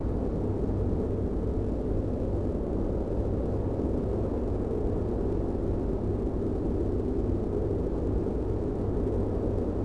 train Platform ambience; Star Wars Obi Wan; XBOX

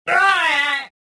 pushed, rodian

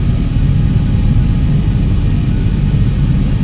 cockpit steady, ambience; Star Wars Jedi Knight II Jedi Outcast; XBOX

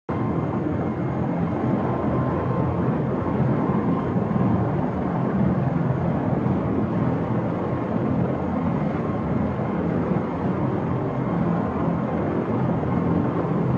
Ambient Blimp Int; Marc Ecko's Getting Up: Contents Under Pressure; XBOX

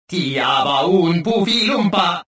escaping

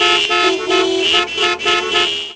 Pause Options Env Loop; Cars; XBOX

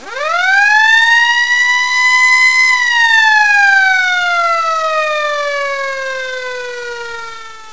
siren; Totaled; XBOX

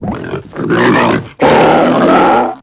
growl, creatures; tusken; Star Wars Obi-Wan; XBOX

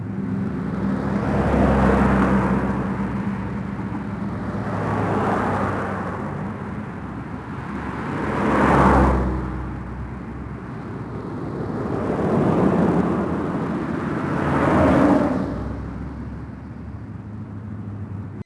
Cars Passing medium muffled; ambient

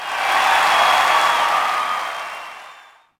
Cheer, Crowd, reactions

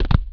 land step conc, walking; skater; Tony Hawk's Project 8; XBOX

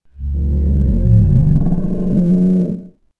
Dino Generic; Bored

